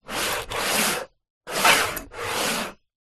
household glass jar movements scrape on wooden surface